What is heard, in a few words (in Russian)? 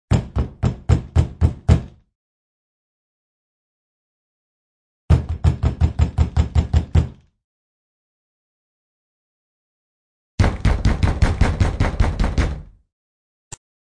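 стук в дверь и стремительный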